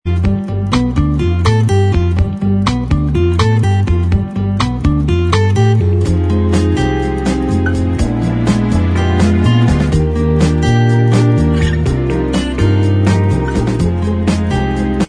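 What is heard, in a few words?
bossa version Instrumental Track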